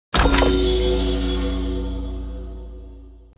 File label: Chest open reward